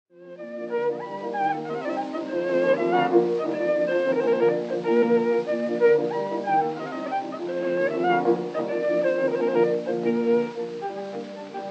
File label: for violin & piano; Op. 27